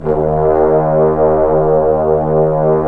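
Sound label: BRS, Bass Trombone Section; Roland L-CDX-03 Disk 2; Bs.Trombones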